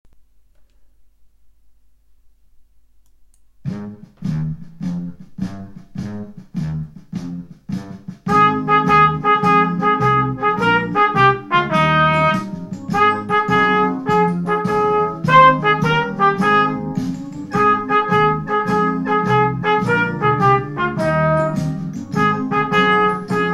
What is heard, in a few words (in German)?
Trompete; DisneyMusic